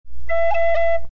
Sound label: e roll